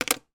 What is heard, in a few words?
office calculator small put down on table; sound effects one